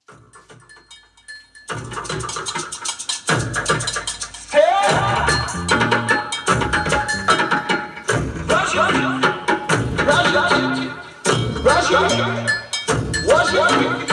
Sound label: Cowbell Skank